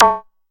Zap; Drumset 03

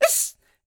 YELP, World Voices, ANDES FX

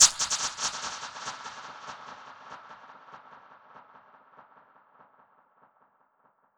Perc Hit, dub percussion; musicradar

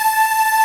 Ending loop

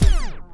SCRATCHES

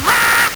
LSDj, Nintendo Gameboy; 300 Drum Machines

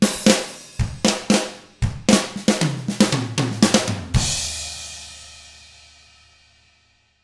ROCK

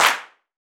Gospel Clap; Roland BK-5; Claps & Snaps

